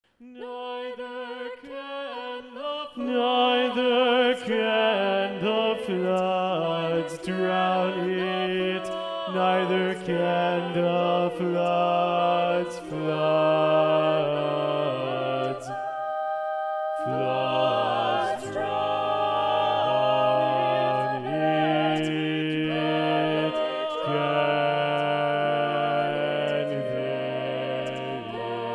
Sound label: SATB Bass 2b Predominant